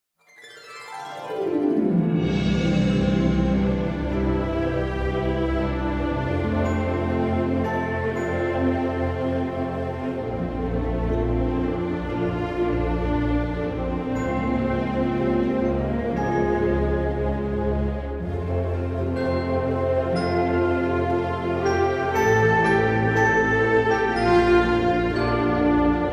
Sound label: Orchestral; 1 Hour Speedwrite